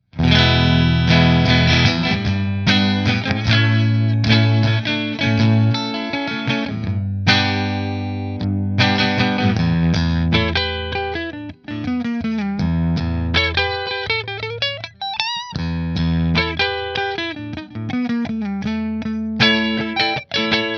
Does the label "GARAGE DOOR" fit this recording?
No